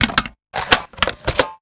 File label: flamethrower reload; Stereo; Weapons; Real Guns Sound Pack 2